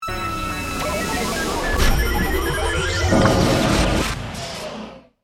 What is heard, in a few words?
Stinger Digital, Production, Element, Stinger, Digital, Bleeps, Sweeper, Electrical; Stinger Sound Effects; BlastWave